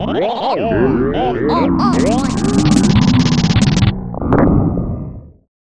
Final Theme; Mario Paint

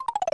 Dry Bone Stomp

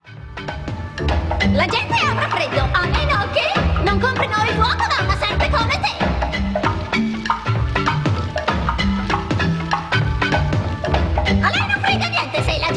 Rap Italian, Music sample

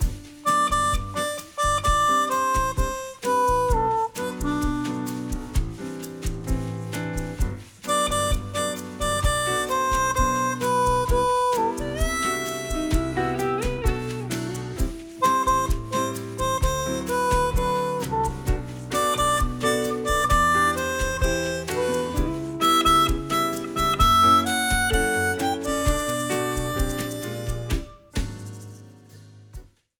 Beach Theme Bossa Nova Arrangement; SM3DL

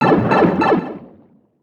Pipe Travel Water; SM3DW

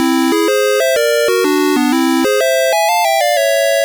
Jingle; Stage 16

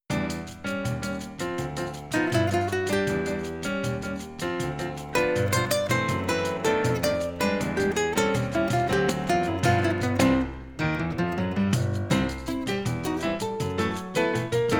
Ground BGM (Acoustic Ver.)

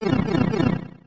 Pipe Travel, Sound effect; SM64

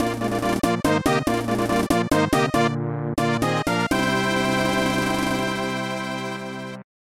Big Boss Clear; SMW2YI

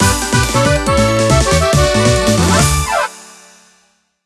Mini-Game Win; Mario Party 4

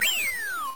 Checkpoint; SMA2

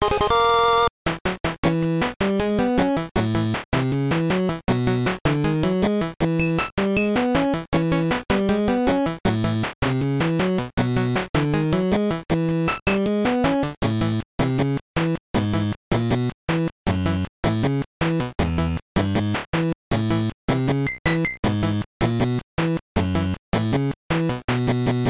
Win Theme

